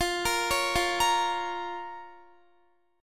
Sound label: F Suspended 4th Sharp 5th F, Guitar; Fsus4#5; arpeggiated; x,20,20,18,17,17